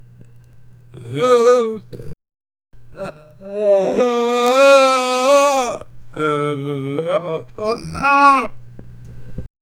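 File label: man anguishing sounds, anguish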